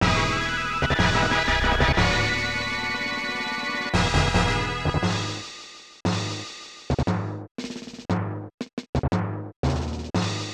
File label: Victory; Sugoroku Ginga Senki; SNES